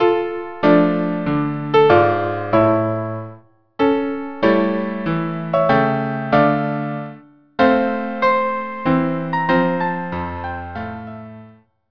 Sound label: Sarabande; Gluck